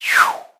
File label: swoosh